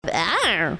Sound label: awrr